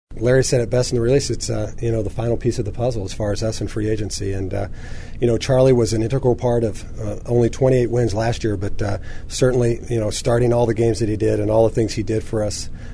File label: BELL